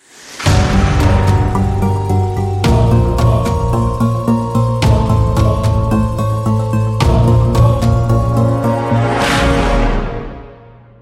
nhac nen cang thang va chan nan

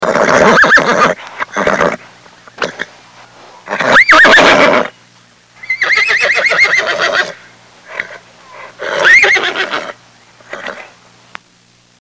am thanh cua mot con ngua hoac ngua

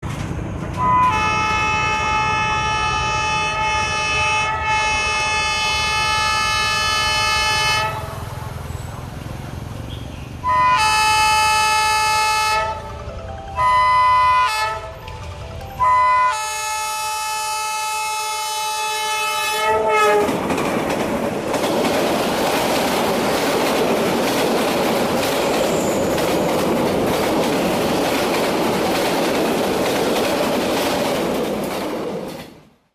âm thanh xe lửa chạy và bấm còi

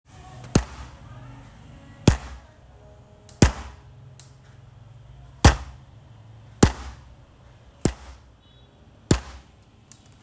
tieng ban sung luc

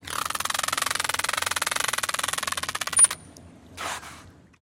ca heo keu nhu tieng may

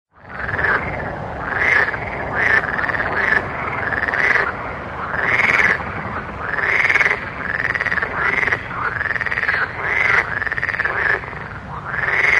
tieng ech keu trong cong vien vao ban dem

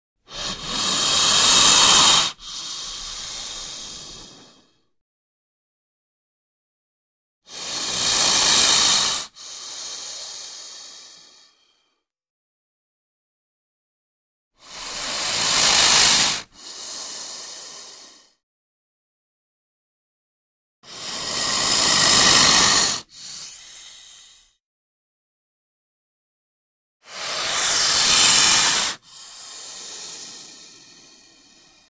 tieng rit canh bao cua ran truoc khi tan cong

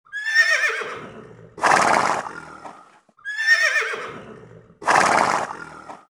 con ngua khit mui va hy vang

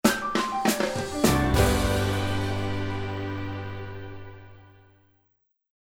tieng am thanh chuyen canh video vui ve

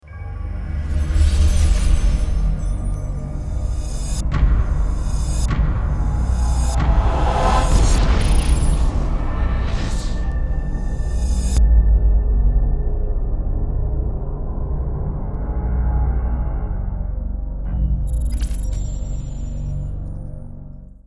nhac nen intro hoanh trang

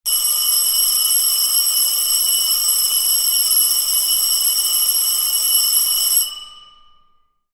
tieng chuong reo het gio o truong hoc

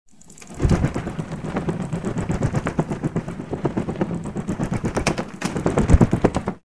tieng dap canh cua doi

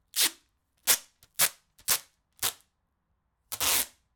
tieng keo bang keo dan keo bang dinh

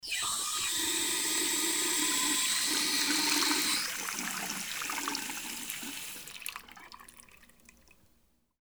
tieng am thanh van khoa voi nuoc dang chay

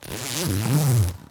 tieng keo day keo ao quan

